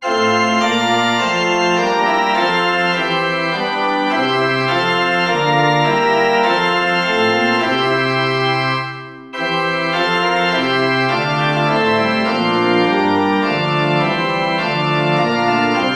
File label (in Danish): forspil